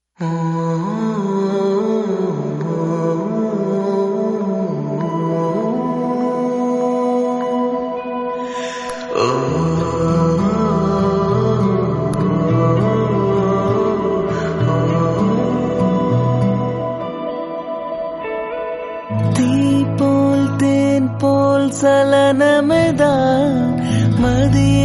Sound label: Male Version